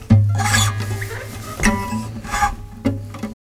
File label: MORE SQUEEKS, Nylon Guitar